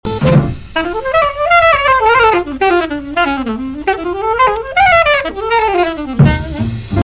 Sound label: Tunisia Break